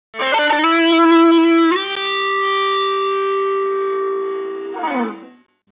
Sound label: Live Guitar Message